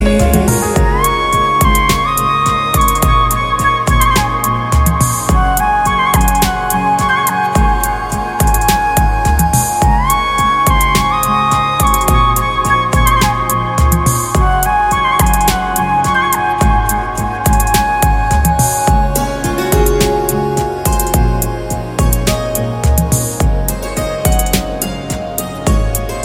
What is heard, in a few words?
Song Instrumental Flute Bgm; Kondapolam; Telugu